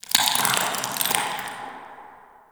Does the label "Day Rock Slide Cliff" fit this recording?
Yes